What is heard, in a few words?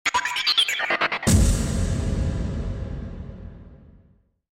Radio Stager; iLife Sound Effects